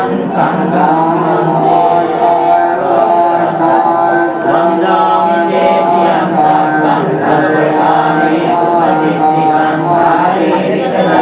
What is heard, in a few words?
chants